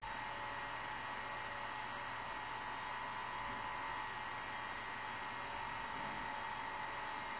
turbine load interior, engines tanks; War Thunder

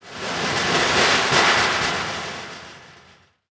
Amb Constructions; Red Orchestra Ostfront 41-45

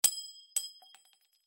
shells 20mm bolt

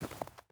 footstep rubber boots snow walk; Rust

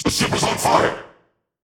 Alarms Fire Alarm; World Of Warships

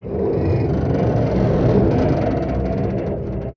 damage vehicle; World Of Warships

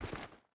footstep rubber boots stones walk; Rust

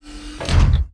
snipermode on; World of Tanks Blitz; GUI zoom

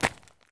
run concrete; Escape from Tarkov

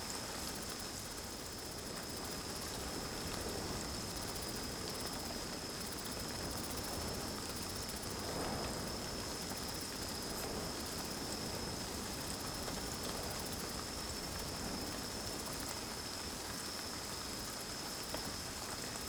ambience desert forest night calm drizzle; Rust

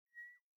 sting bird swoo; Rust

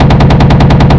Automatic Gun 2A42 In, Weapons; DCS

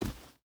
footstep boots sand jump land; Rust

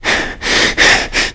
player climb breaths; Call of Duty Modern Warfare

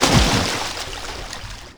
water land slow deep

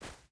footstep barefoot snow run; Rust

